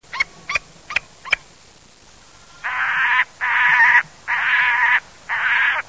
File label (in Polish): Raróg górski - Falco biarmicus; (dotychczas w Polsce nie obserwowany)